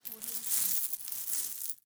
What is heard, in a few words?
coin pile pickup